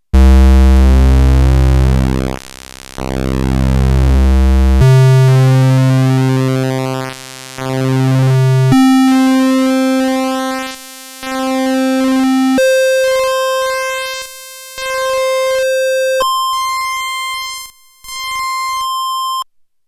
XP PULSE WIDTH, Roland alpha juno synth

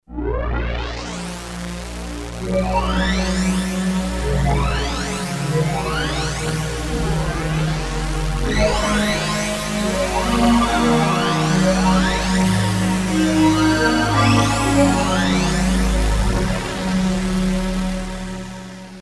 pad freq; Creamware minimax

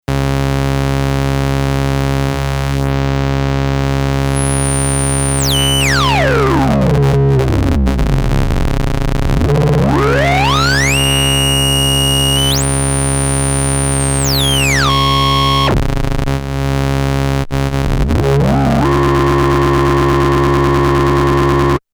notch, nanozwerg; MFB nanozwerg

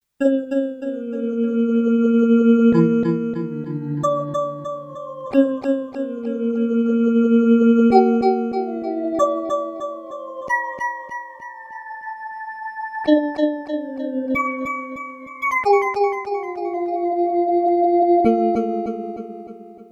korg m1r synth

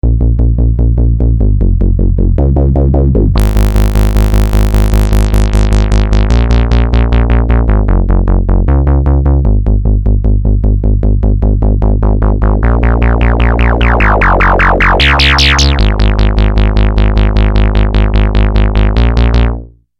bass, Novation Bass Station II; bass 2; analog monophonic machine with subtractive synthesis

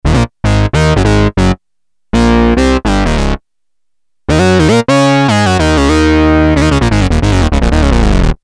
moog, novation bass station 2; Moog like; analog monophonic machine with subtractive synthesis

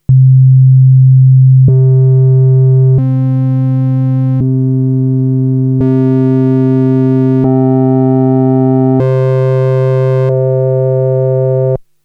harmonics, yamaha tx81z

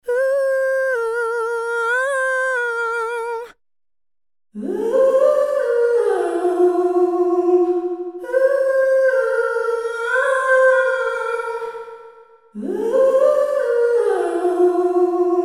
voice club, boss sx700; vocal reverb

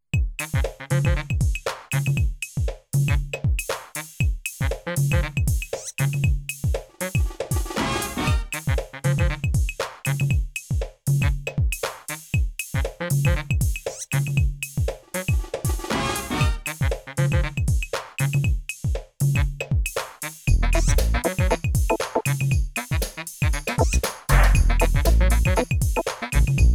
internal beats; emu carnaval; Motorola 68000 CPU (mc68hc00fn10) system handles up to 32 voices polyphony

